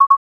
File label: double Beep